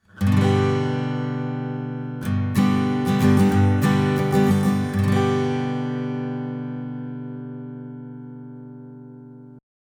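Tascam Model 24 A Git Schoeps Mk4 Gain 3 Uhr, acoustic guitar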